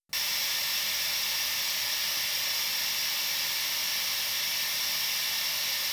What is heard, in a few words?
noise less volume, electric guitars